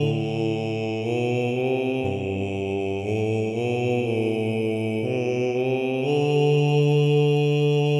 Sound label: man bass